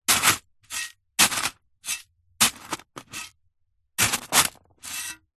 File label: industrial shovel space metal several digs into gravel stones; zapsplat